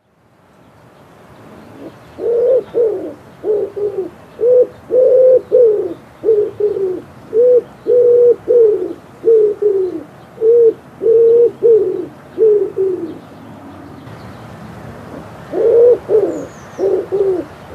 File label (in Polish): Grzywacz, Ptaki; Kurs PTTK; Przyroda